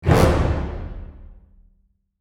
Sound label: orch hit